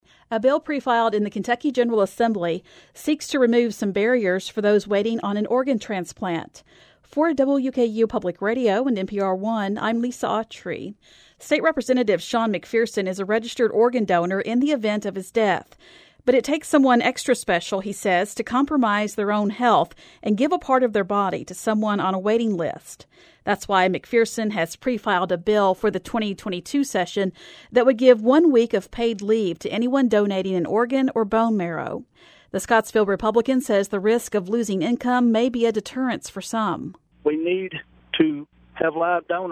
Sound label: organ